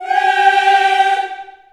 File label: G4, Choir; USB Soundscan vol.28 - Choir Acoustic & Synth; AKAI